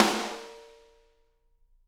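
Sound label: snare drum; Bob Clearmountain Drums I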